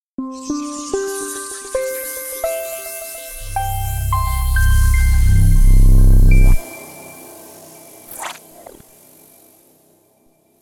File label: jingle